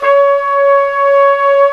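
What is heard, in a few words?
WND, Hybrid Winds, Flute & Flugel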